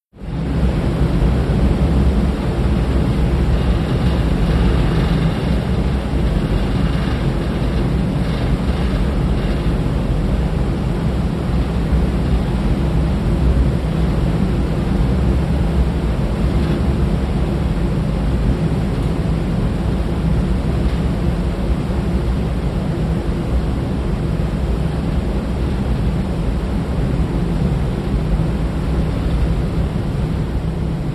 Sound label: BOATS & SHIPS STATEN ISLAND FERRY: INT: Engine room, steady drone, rumbly.